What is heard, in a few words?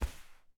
crouch slide